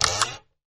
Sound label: chest Open